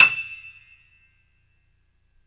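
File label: piano, Grand piano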